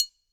One Shot Perc

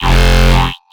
F Bass Shots

